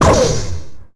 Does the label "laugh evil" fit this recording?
No